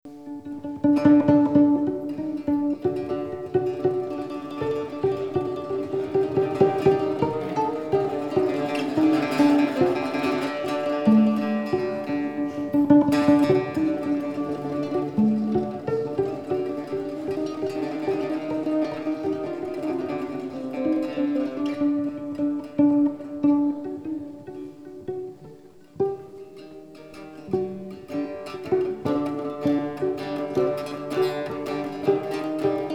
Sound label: instrumental havanagila